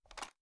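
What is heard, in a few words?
weapon foley assault rifle inspect; gmod; viper; weapons; scharlie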